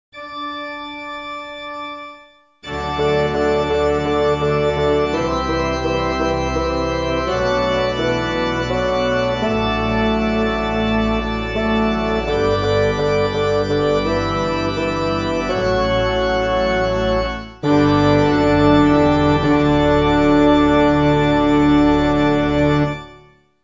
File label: penitential act absolution midi